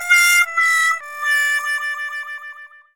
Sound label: fanfare lose